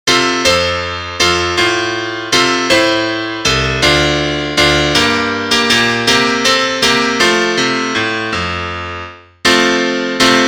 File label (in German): Klavier; ich geh mit meiner laterne